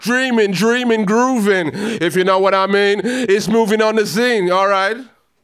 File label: A PHRASE, RAGGA IN A